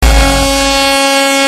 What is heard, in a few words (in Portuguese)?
Buzina